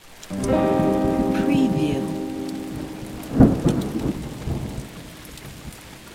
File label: Strum no effect, electric guitar 2/4